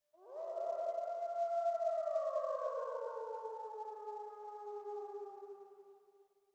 sting wolf; Rust

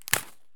flakes open; Escape from Tarkov

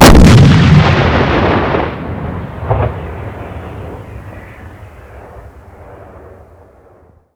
Vehicle Weapons; Red Orchestra Ostfront 41-45

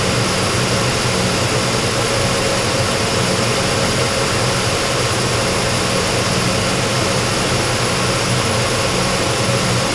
Aircrafts Engines Engine TV3117 In R; DCS

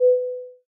alarm alt; War Thunder

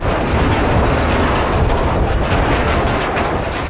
damage vehicle; World Of Warships

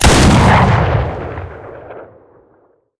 cannon 37mm sh37 shot; cannons; War Thunder

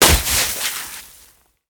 snowmobile suspension splash; veh snowmobile suspension splash

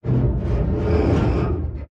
damage vehicle; World Of Warships

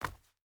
footstep burlap concrete jump land; Rust

